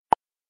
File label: Pop Up; Facebook